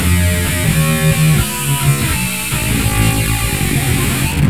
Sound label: chorus